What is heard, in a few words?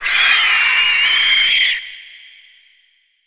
Spider Trap Emerge; Evolve; Gorgon